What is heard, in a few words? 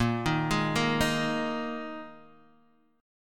Bb 7th Suspended 4th Bb down Guitar DADGAD